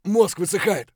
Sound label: dehydrated; Escape from Tarkov